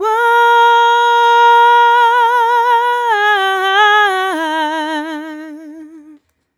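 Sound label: "uh" female, 127, e-flat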